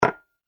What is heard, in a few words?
Kitchen Light Glass On Wooden Table; Kitchen Sound Effects